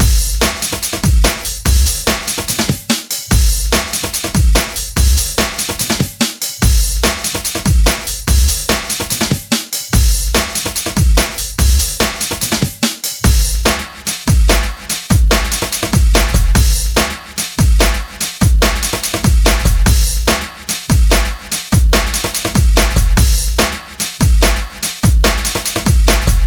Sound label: breakbeat 1 and 2, 145BPM